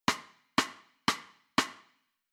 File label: Rhythm Drum Set Side Stick